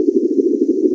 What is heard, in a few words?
hoverboat rise; There; ThereClient